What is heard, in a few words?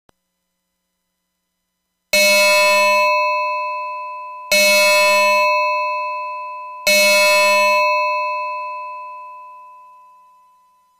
bell like, Sound synth; PIC32